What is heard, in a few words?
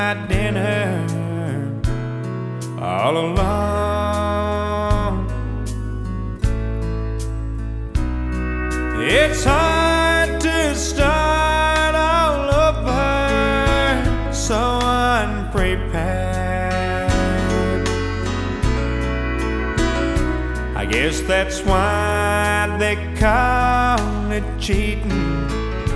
country